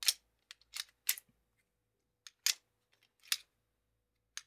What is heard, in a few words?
Loading Metal Bullet Into; Fairlight Sound Lib